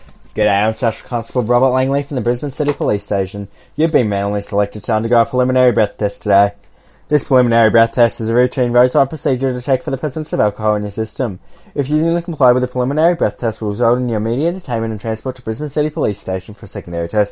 breath test

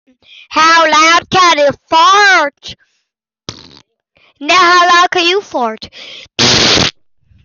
fart loud

